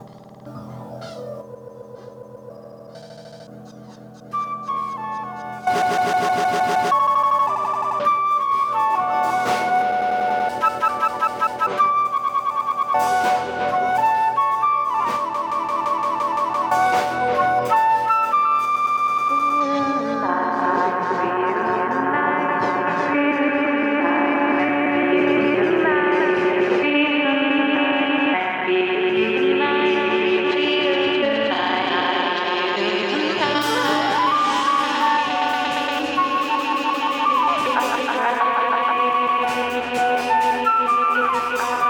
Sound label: ambient stutter